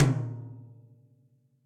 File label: Tom Mid DeepSnareDynamics; Drum Hits; Ableton; Factory Packs; Session Drums Club; MPE Hits